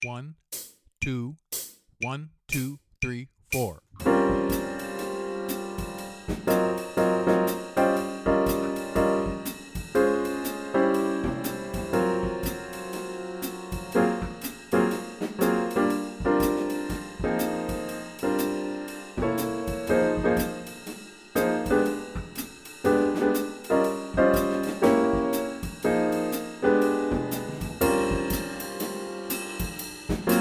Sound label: Bass Play a Long BLUES, Jazz; Jazz-Band; All-State